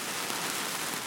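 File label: sparks, weapons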